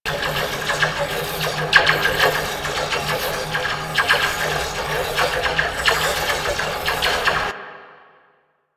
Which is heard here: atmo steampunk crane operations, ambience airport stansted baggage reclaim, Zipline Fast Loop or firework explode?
Zipline Fast Loop